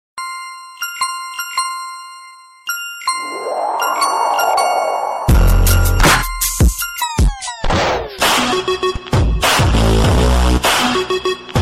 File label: 3d Car Tone